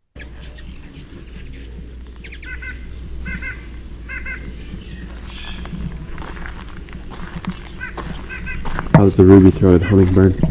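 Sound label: Ruby-throated Hummingbird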